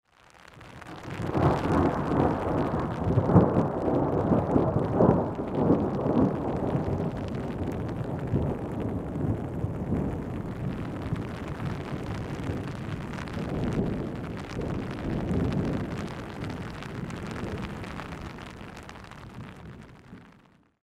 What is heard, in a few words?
good end thunder